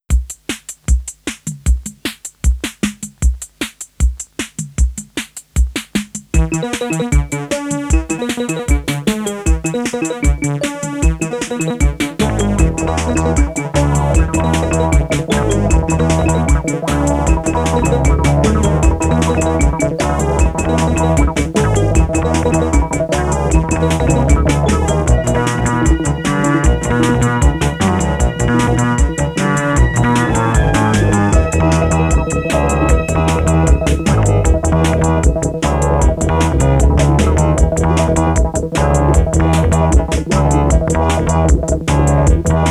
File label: B3 Instrumental